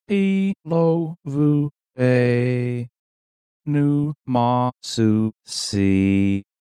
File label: melody